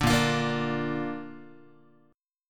A sharp Major, Guitar; strummed; JGuitar

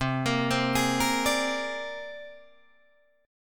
C 7th Suspended 2nd Sharp 5th C Guitar Drop D

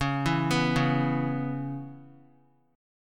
C sharp Minor 7th, Guitar; arpeggiated; chord; x,4,4,4,1,x; JGuitar

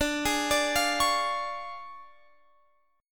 D Minor Major 7th Flat 5th, D, Guitar Open F; DmM7b5; arpeggiated

